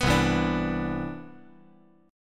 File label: C Major 9th, C, down, chord